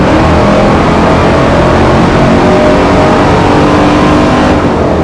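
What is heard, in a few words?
third, car engine; vehicles; tdmcars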